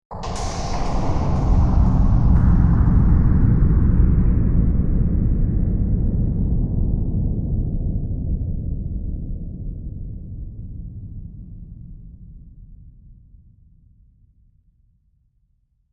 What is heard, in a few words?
Ejected Into Space, Sound of object being dumped into space; Tech